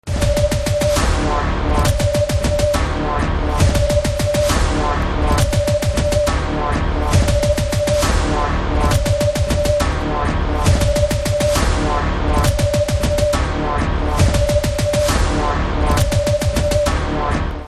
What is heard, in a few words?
Trailer Theme Ringtone; Lakshya